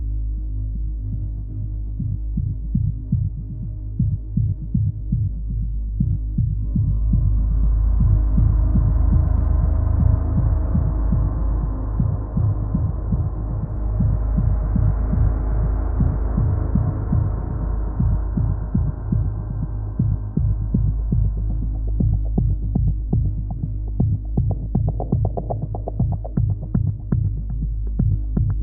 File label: Pad Before Start 3 semitones down; Monster Energy Supercross The Official Videogame; PS4 Steam Switch Windows Xbox One